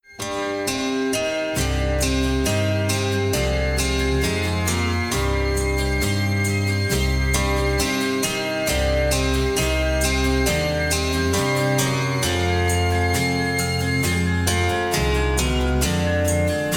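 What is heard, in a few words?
melody; melodiya iz filma krestnyy otec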